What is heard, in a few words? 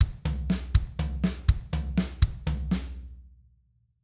Triplet Fill, drum